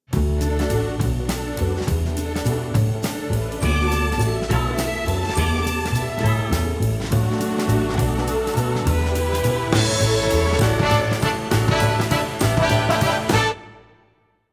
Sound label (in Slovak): vianocne zvonenie Backings; Kaufland